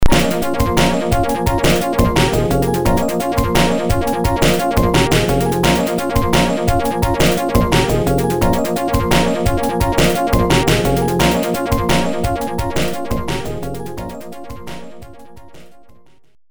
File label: Continue (Alternate); Smash TV